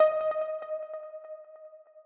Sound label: EPiano; osu